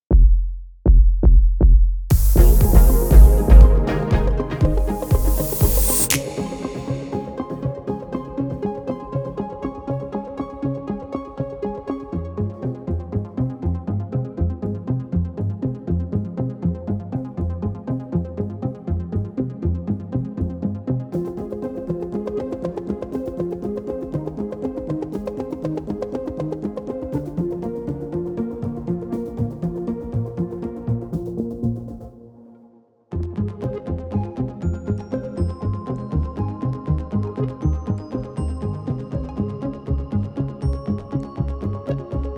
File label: Electro 120 Bpm; Ecosysteme Connecte; documentaire